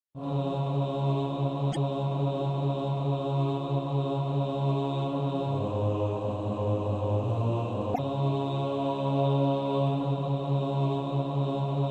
bas

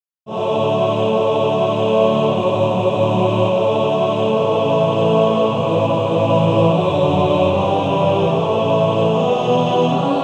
Male Choir TTBB

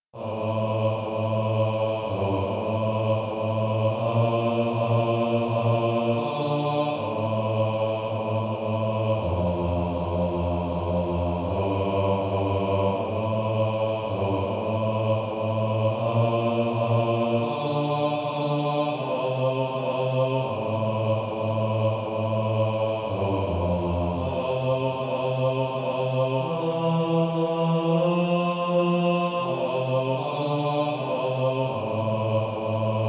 Male Choir; He Leadeth Me

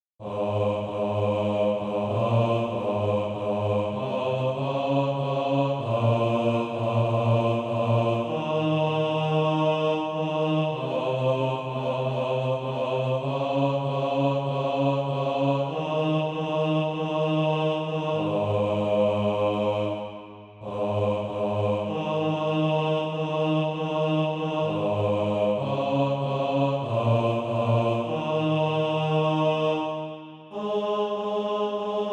bas

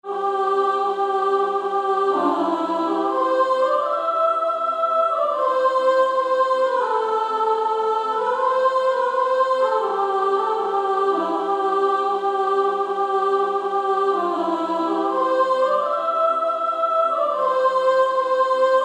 Female Choir

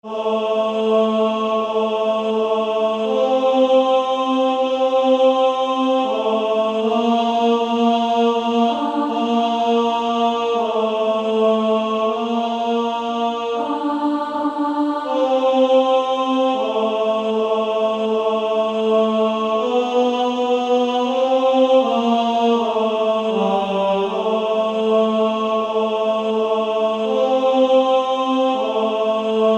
Female Choir A2; Nearer still nearer

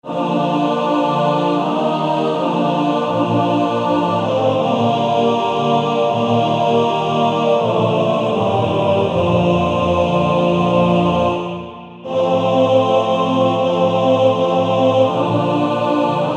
Male Choir TTBB

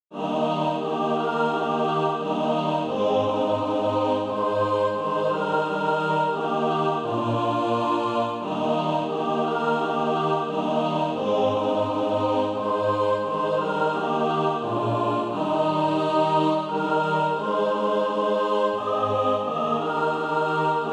mixed; SATB

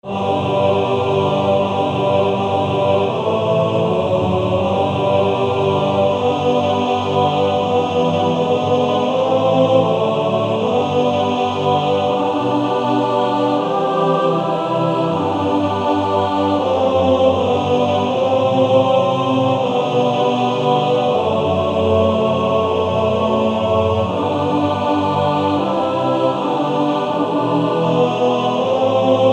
Male Choir TTBB

